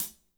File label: HAT L, WARM BALLAD; Spectrasonics; Burning Grooves CD2